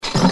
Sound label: metal, hammer; machine